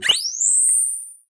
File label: nvg on; firearms